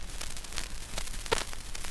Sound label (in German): knistern